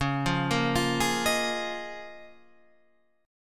C sharp 6th Add 9th C sharp down Guitar Standard; arpeggiated; C#6add9; JGuitar

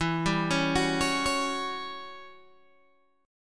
E Minor 6th Add 9th, Guitar, Open G; chord shape

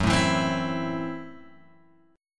F sharp Minor 7th Flat 5th F sharp Guitar Open G

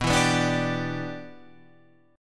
E Minor 7th B

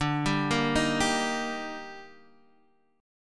D sharp Major D, chord; arpeggiated; JGuitar

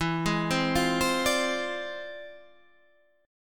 E 7th Flat 9th Sharp 5th; E7b9#5; arpeggiated

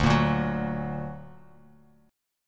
D Augmented D down Bass Standard; D+ strummed; JGuitar

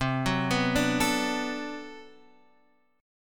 B-Minor 7th C

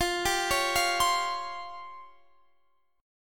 Db Major 7th Flat 5th, F, guitar

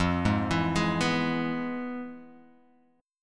F Minor Major 7th Flat 5th, F, Guitar, Open G; 3,1,2,1,0,x

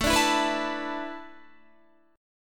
A Suspended 2nd Suspended 4th, Guitar; Asus2sus4; strummed; 16,17,16,16,16,17

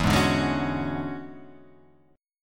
E 13th, Guitar, Half Step Down

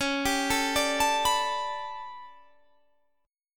A 11th Db, guitar chord

